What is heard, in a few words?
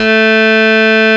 ELEC ORG; CMI Sound Library Rev 1.3